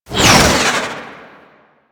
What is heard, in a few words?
Concrete Archgun Projectile By; Warframe; Archwing